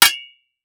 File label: Weapon Sword Hits Sword; AdobeCC